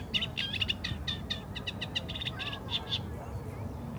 teichrohrsaenger

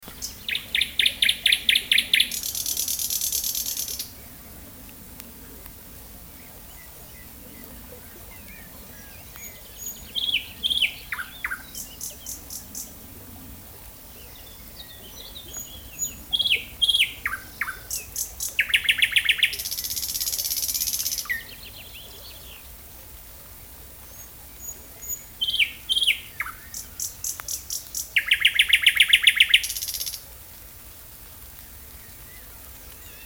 Sprosser, Hemmerder Wiesen

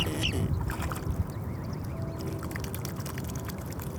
hoeckerschwan

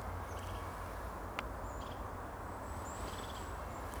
haubenmeise

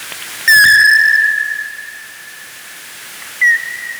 grosser abendsegler

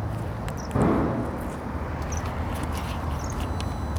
eisvogel

